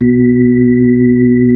B-3, Keyboards of The 60's and 70's, B-3 Brite; Xfade

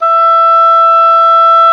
OBOE, Orchestral Winds, Oboe mf f; Roland LCDP04 Orchestral Winds; Oboe 2&3

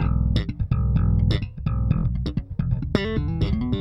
Slap, Bass; Bass X

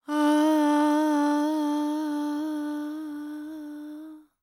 World Voices, INDIA; ILIO; Vocal Planet

